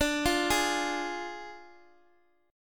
D Diminished, Guitar, Standard; Ddim arpeggiated

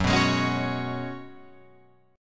Gb Suspended 2nd Suspended 4th, Guitar, Open F; strummed; 6,6,8,8,9,8

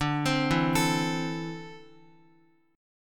D 7th Suspended 2nd, D, down, Guitar; arpeggiated

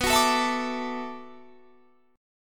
B Minor 11th B down Guitar Standard; strummed; capo 9

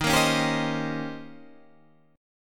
D sharp 13th, Guitar, Half Step Down

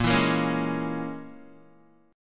Bb Minor 7th Flat 5th, Guitar; strummed; 6,7,8,6,8,x; 4E,4A,5D,5G,5C,6F

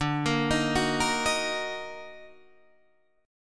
Eb Minor, D, Ebm/D Chord; Ebm/D arpeggiated; JGuitar

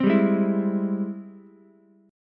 G sharp Augmented Major 7th, Ukulele; strummed; 5,7,4,7; JGuitar

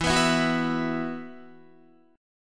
E Suspended 2nd, Guitar; Esus2; strummed; 12,14,14,11,11,11

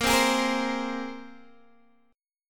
Bb 7th Suspended 2nd Bb, Guitar DADGAD; Bb7sus2 strummed; x,13,10,13,13,10

